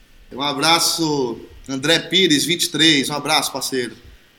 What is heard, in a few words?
haha